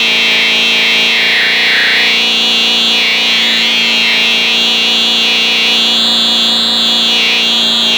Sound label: Ambient Tech Drone; Warframe; Tau; Ambience